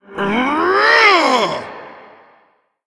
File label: Elemental Attack; LivingAzerite